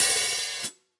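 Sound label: close Open Hat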